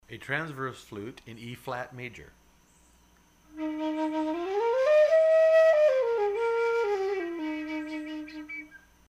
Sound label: FLUTE Eb